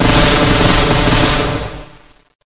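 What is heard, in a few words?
weapon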